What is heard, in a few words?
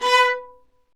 String Sections, Violas Marcato